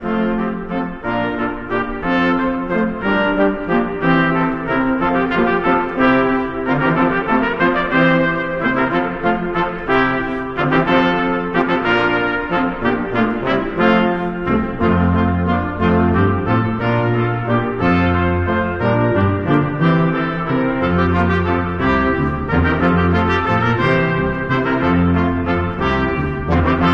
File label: Brass Quintet; Gloucestershire Wassail; Christmas